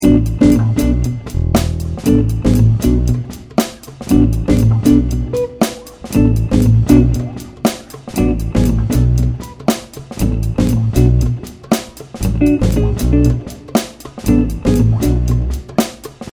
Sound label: loop beam